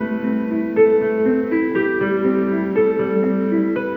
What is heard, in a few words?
piano, sad loop